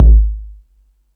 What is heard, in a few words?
Lotsa Kicks, Drum Machines E-MU Pro-Cussion